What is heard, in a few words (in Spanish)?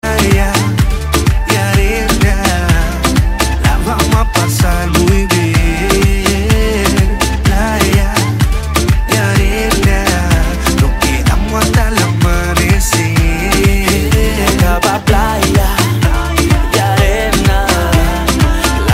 playa reggaeton; ringtones; latino